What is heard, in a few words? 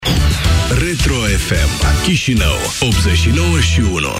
Retro FM; Chishinau